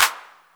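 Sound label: analog clap; FUNK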